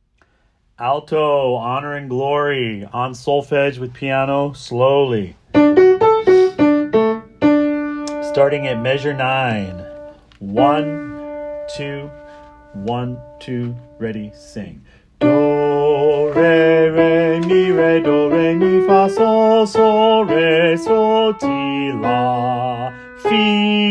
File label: Alto solfege slow; Wando Choir Class Placements